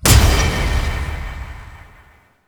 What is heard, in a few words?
fail sound; osu